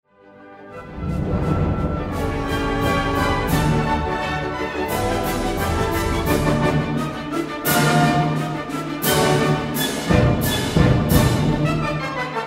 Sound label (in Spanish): Polka Bajo Truenos y Relámpagos; Johann Strauss